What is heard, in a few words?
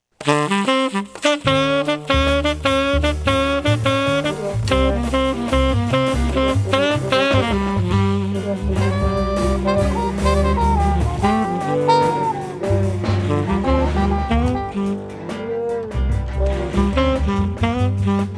Talking Blues Instrumental